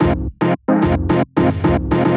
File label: 110 Fm, Melodic Loops; M8; Electronisounds